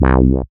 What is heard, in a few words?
SYN MC WAH, Roland MC-202